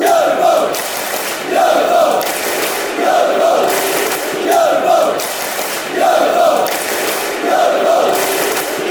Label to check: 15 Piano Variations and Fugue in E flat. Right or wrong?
Wrong